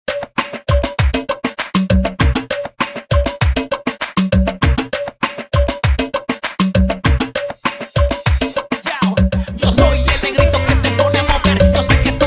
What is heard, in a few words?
remix bpm 99; Prodeejay Remix